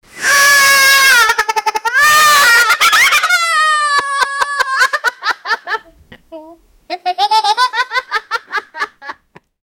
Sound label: kids laughing